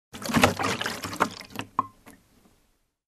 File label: Liquid Gas lapping